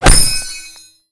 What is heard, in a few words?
impact; saxxy